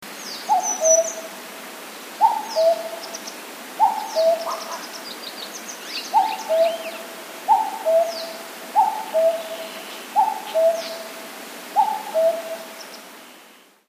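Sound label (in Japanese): kakko, cuckoo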